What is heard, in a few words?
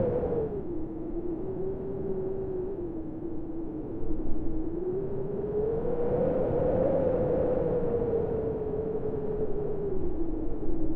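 howling wind at high altitude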